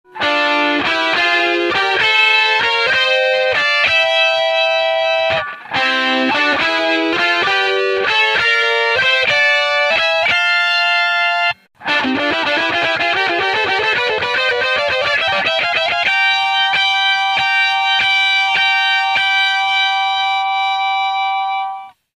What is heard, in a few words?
Thirds, DigiTech Red Special Pedal